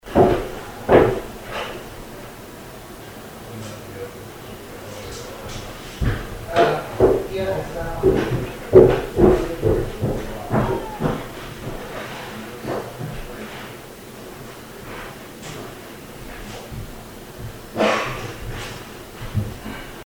Home Master Bedroom unexplained yell

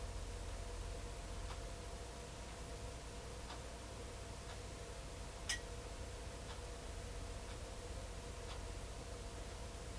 Unexplained glass or metal tap sound Back Bedroom

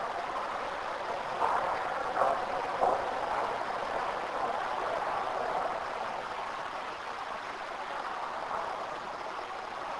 Unexplained footsteps; Case 023 Major Graham Mansion; Slave House; Olympus

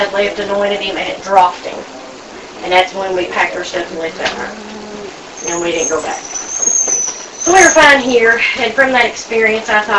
Daughters Bedroom Unexplained Moan AMPLIFIED

